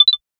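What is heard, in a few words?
subtle casino slot machine; mobile game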